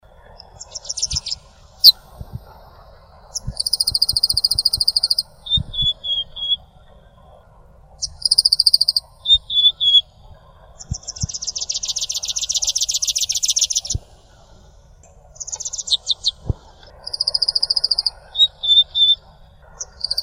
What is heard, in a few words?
long tailed widowbird